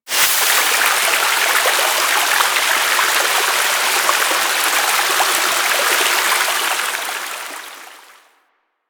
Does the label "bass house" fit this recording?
No